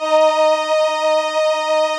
VP-330, String Machines

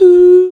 Human Perc

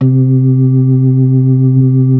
B3 C 4; Best Service; Hyper Dance

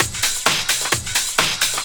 groove, DRUMLOOPS

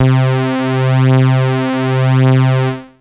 Techno leads, hits and riffs

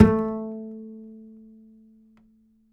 Upright Acoustic Bass, 1ST POSITION; Larry Seyer

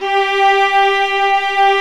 STR, Vlas Sect, Agitato; Roland L-CD702; Vlas Sect1-2

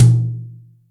Brsh Jz Lo, Acoustic Tom; Yamaha DTXpress

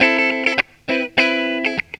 GTR, Funky Elements GTR RIFFS; Zero-G

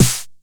Snare, retro house samples, Drum Hits

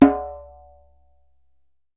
DJEMBE, African Perc

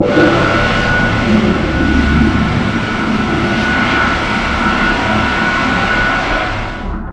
AIRPORT, ATMOS; USB Soundscan vol.33; AKAI; Disjointed Textures

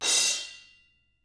Drums, ZILD MALLET

